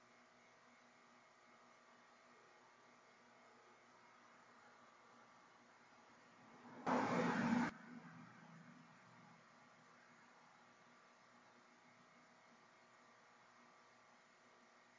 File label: Chapel Static